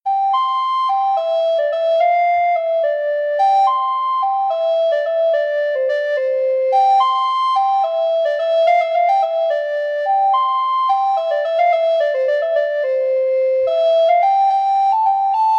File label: wilsons wilde, recorder